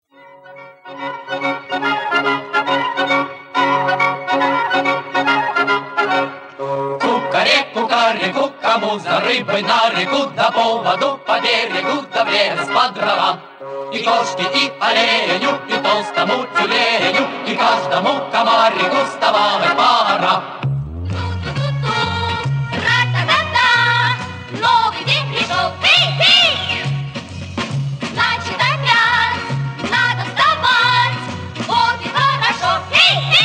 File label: morning song; Red Hat